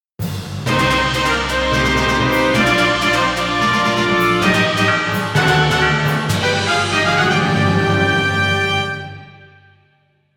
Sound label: Game Start